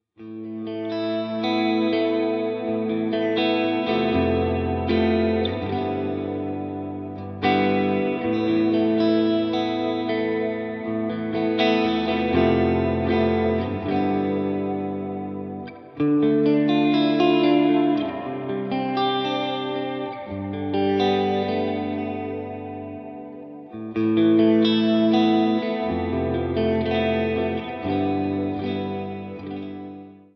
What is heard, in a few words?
room; ventris